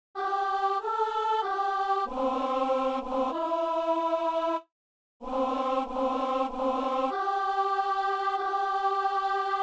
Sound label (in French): psaume alto; Messe du 17 janvier à Saint-Denis; Psaume 90 (91)